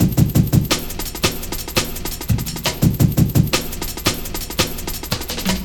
Break, Drum & Bass, Beats & Breaks; Sonic Foundry (Sony Creative Software) - Fluid Dynamics Drum & Bass

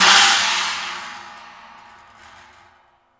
PANG, cymbals; Sampleheads; New York City Drumworks VOL-1

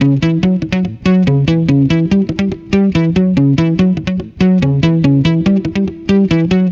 guitar, stringed instruments

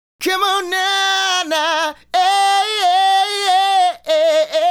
Male Solo, Hip-Hop and RnB Vocals

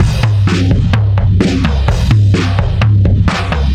Jazzy Drums 128; musicradar

